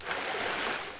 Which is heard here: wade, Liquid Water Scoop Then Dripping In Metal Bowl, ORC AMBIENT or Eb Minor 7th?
wade